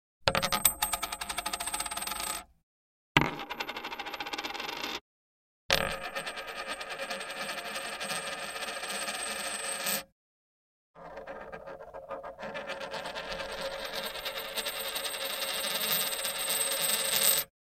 Single Coin Drops Onto Hard Surface w Ring Off, Household